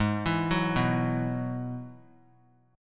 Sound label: Ab Minor 7th Sharp 5th Ab, down, 3C, 3F, 3A, 3D; arpeggiated; JGuitar